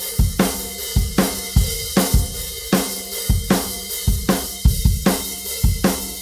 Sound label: drum loop 4 bar 4-4, 154